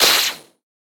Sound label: Fight; boomerang fu